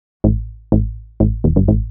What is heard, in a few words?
SNTH BASS HOUSE 125 A; eJay